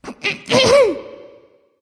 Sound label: clear throat; smite; ratatoskr